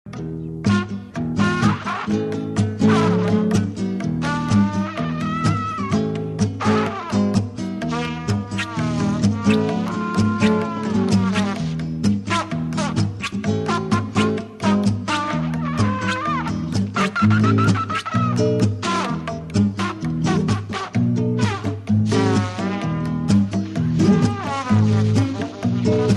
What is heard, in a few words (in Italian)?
Tromba